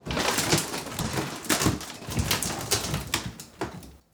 wood cracking

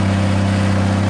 diesel engine med rpm

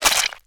blood bite

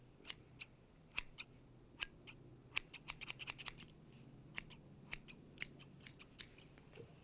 scissors hair

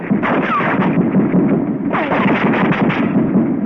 cartoon explosions

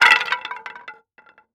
wood stick drop

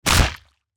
bone break

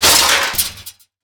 metal parts crash

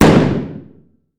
magnum shot

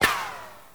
laser shot fly by

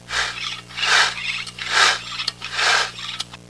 HAND PUM

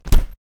suitcase drop

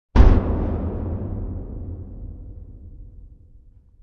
metal container hit

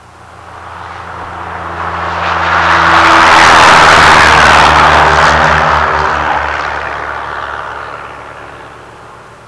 AIRPLANE

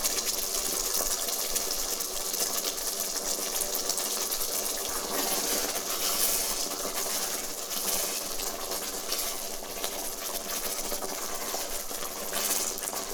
food boiling

